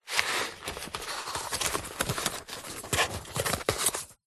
cardboard box small lid flaps open close movements; zapsplat